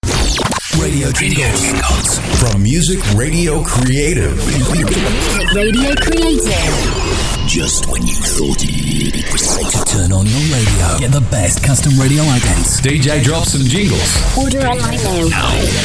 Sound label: radio jingles